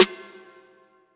Bell Snare

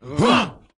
Chant, Vox

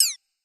Perc

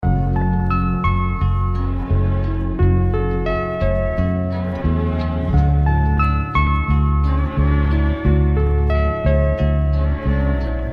BPM 80

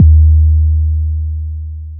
808 Kick, Bass; Steamroller

